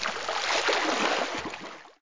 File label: ship building sound; catan